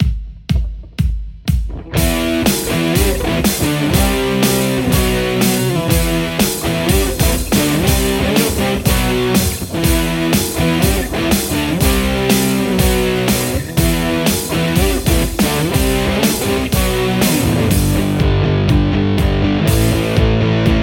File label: Les Paul; poulin